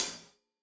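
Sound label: hat closed, hi-hat cymbals stereo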